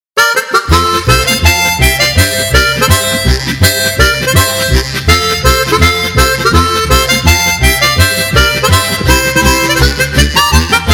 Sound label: polka; Pretula Polka